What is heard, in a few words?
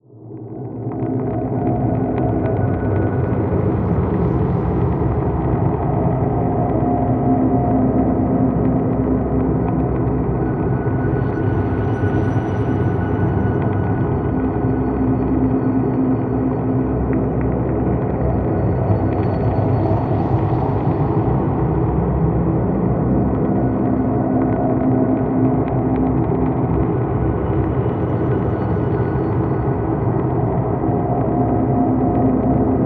ambience, Cinematic Trailer Sounds; Bluezone Corporation; Spectral Line